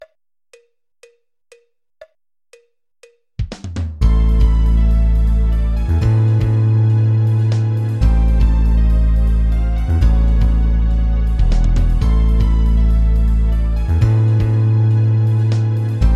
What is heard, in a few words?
guitarra playback